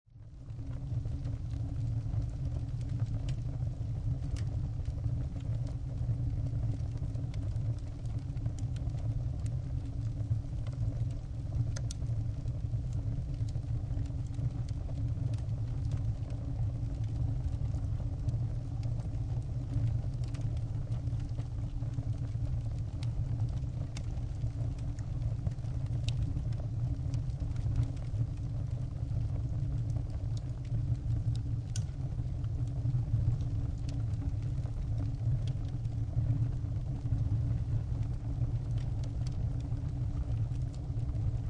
Fire Medium Size Low Rumble, Fire, Medium Sized Fire In Fireplace, Low Rumble And Crackling; Fireplace Sound Effects